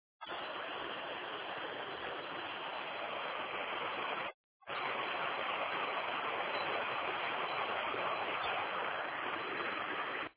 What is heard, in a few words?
Waterfall; croatia; Slunj